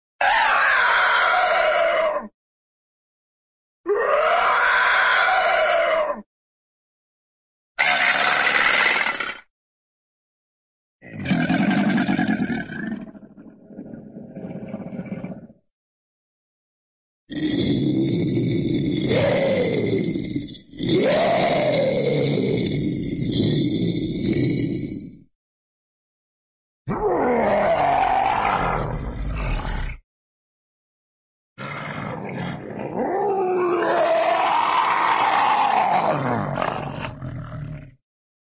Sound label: monster cyclops roar, creature, cartoon; animal